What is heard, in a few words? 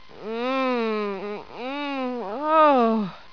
people